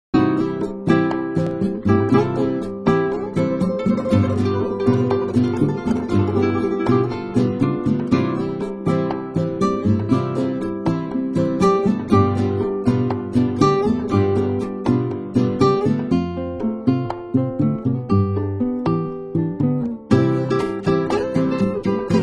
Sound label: Breezy Habanera, spanish guitar